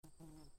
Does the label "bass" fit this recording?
No